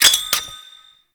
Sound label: Grenade Pickup; Kahl; Warframe; Veilbreaker